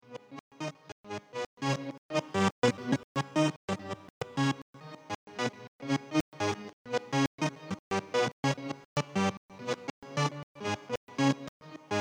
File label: melodic sample